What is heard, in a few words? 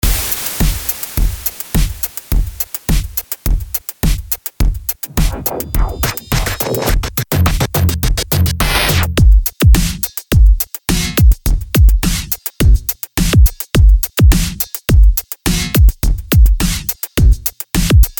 hip hop beat